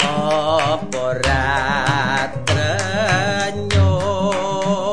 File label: 097 java gamelan with vox; SAMPLED MUSIC LOOPS